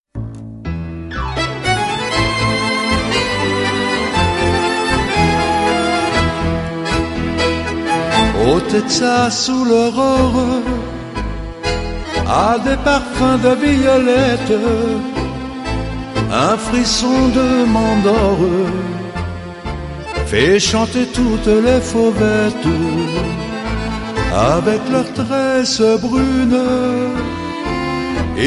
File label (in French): Le tango des fauvettes